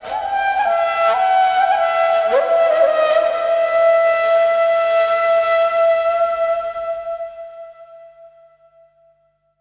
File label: SIERRA FLU